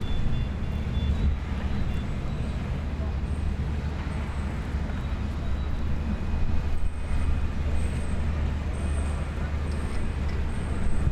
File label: Istanbul Tram